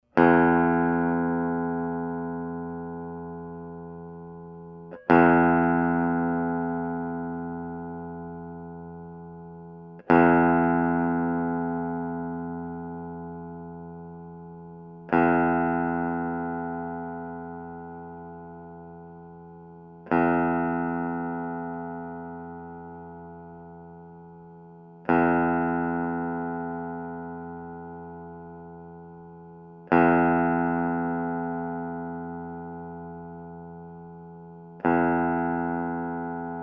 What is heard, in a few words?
Electric Tube10 Single Note